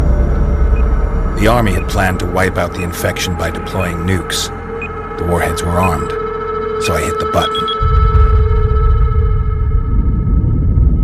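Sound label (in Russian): ядерная бомба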